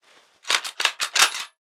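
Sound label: Weapon rifle hunting jam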